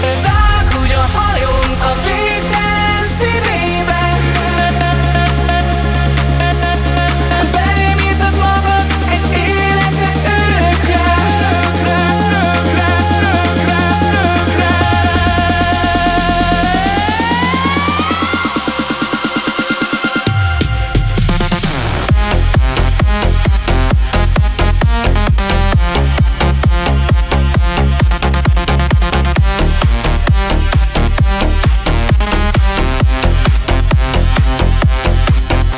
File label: EDM